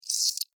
Beetle Chirp, Beetle, Chirp, Vocalization, Insect, Bug; Sound Effects:Animals:Insects; Beetles; Blastwave